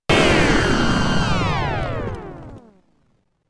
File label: Player Explosion; Retaliator; msx2